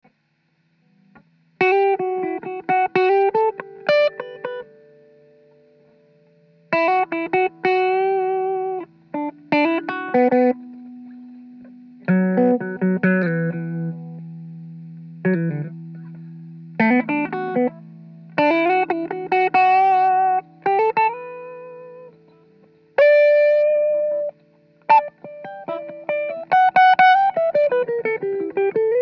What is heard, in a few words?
Five Four Vinyl Blues